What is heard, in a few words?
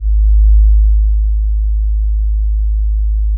low bass; openspace3d; vrcells